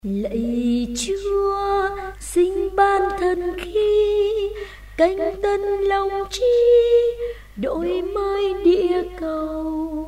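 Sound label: soprano alto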